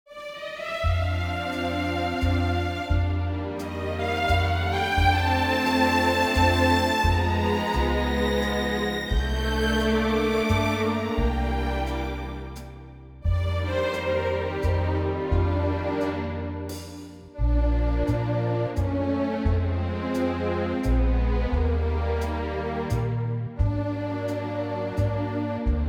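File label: Bb 3/4 orchestral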